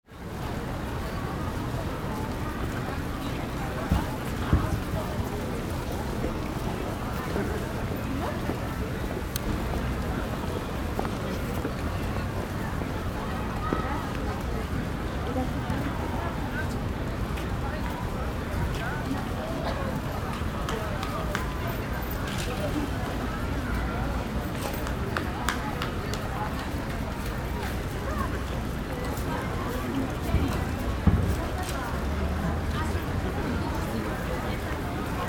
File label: pedestrian zone